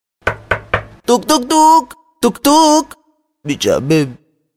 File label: knocking door